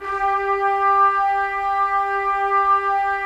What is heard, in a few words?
Trumpets; Roland - LA Composer vol. 4